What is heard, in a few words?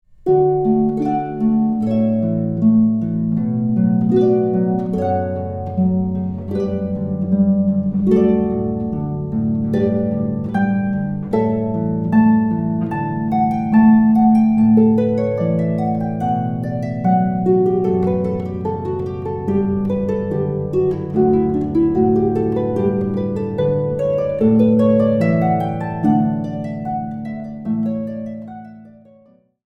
harp